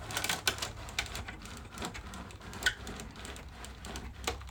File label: brailler reel in paper; Golden Crayon Sounds